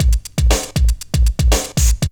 break; Zero G - Man Machine

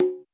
HiBongo, Roland JV 1080; Drums

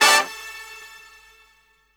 BRASS, Drum & Bass, 150 BPM; Ueberschall; Drum & Bass Resonance

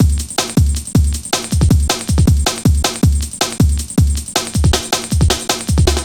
Drum Loops, 158.5; Jungle

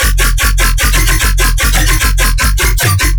bass loop 150 F; Virtual Riot

